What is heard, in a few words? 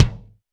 kick; Ableton Foundation FreePacks; Foundation Drums